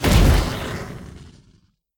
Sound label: explode; Nickelodeon - Destruction Truck Derby